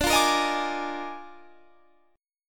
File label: D sharp Major 7th Suspended 2nd Suspended 4th, D, guitar chord